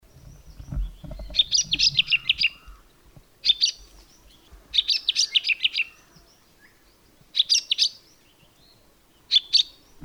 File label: suara jerdon leafbird